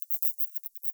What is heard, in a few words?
Nyctalus leisleri; europe